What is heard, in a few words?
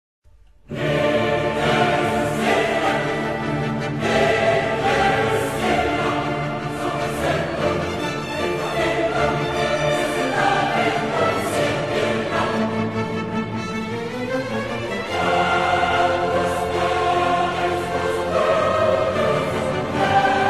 Requiem Dies Irae; Mozart